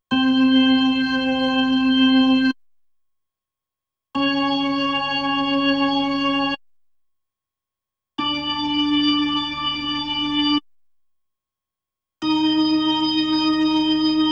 B3 Organ; Masterbits CLIMAX 8

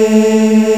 MMM A2, POP CH

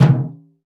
Dofs Dom, Percussion; Roland BK-5

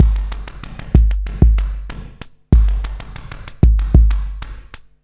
wet kiss, 95bpm

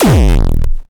Kick Clean Laser

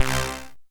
lead; Stereo

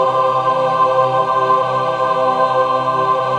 MAJ CHORD, Symphony of Voices

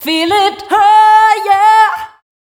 VOC F, FEMALE, Dance Vocals; Best Service ProSamples vol.12; AKAI

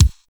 kick, Roland R-70, BD

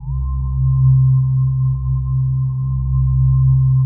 DIGI-PAD; Best Service; XXL 800 Pads; Sample CDs

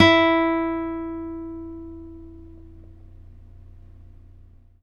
NYLON P E3, GUITARS; Synclavier Sampler Library

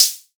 SERGE Hat Closed, drums; Polyend; Stazma & Concrete Collage; Concrete Collage Drum Kit; Concrete-Serge Drums

